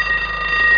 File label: Dring; Mosaïcon